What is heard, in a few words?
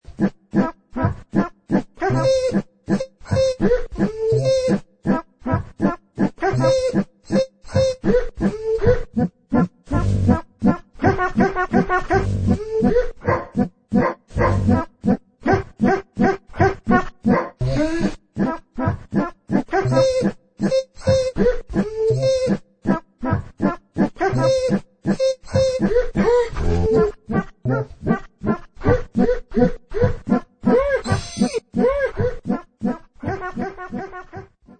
Waltz